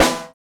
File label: snare, snare house